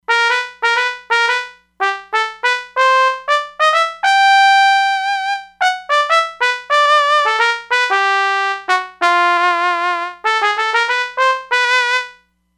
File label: Trumpet, E-mu Proteus-3; Factory patches